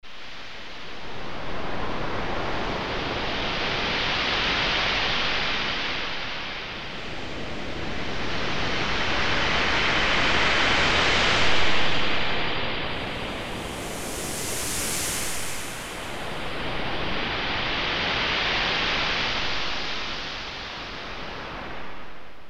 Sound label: sfx By The Sea; E-mu Proteus FX; Factory Presets